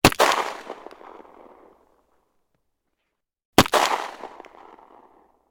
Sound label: gun model 1894; still north media